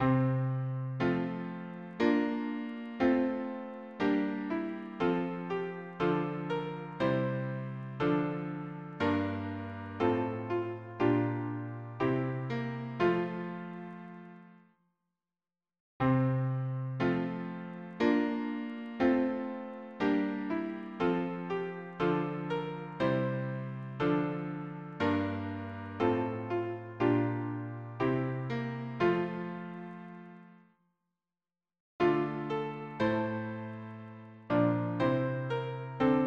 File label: harmony piano